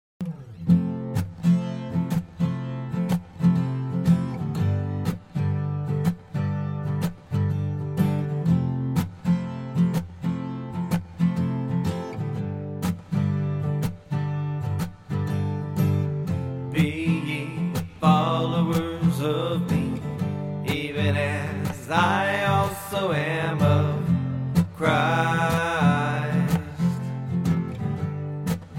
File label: acoustic; 1 corinthians 11 v1-3